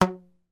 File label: foley rubber band ping tight; zapsplat